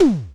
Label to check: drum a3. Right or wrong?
Right